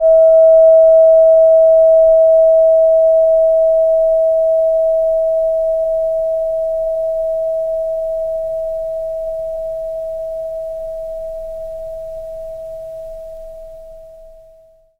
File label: chime; acutonics